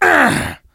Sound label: hurt vo